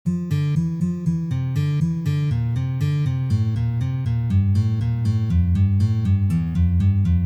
Uprazhnenie dlya igry gammy Re minor

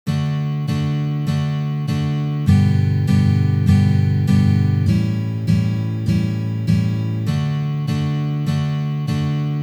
Uprazhneniya dlya igry akkorda Re mazhor na gitare

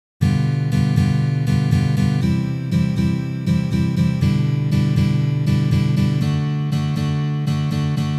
Uprazhneniya akkord G7

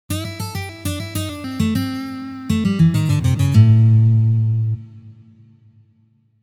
Blyuzovye liki i uprazhneniya

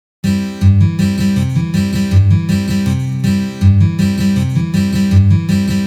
Boj Kantri na gitare

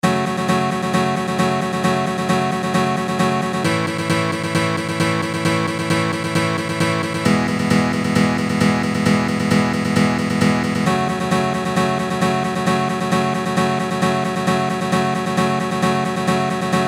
Boj galop na gitare 133bpm

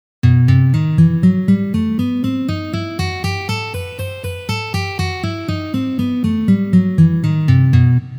Gamma Mi minor garmonicheskij vid pozitsiya

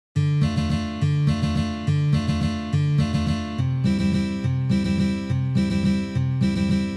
boj na gitare

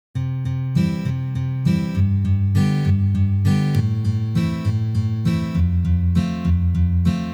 Valsovyj boj na gitare

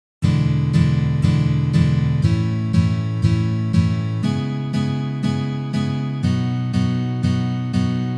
Uprazhnenie c akkordom E7

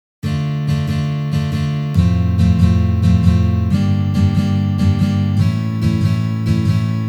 boj

